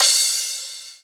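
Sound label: Closed Hi-Hat, Dance Drums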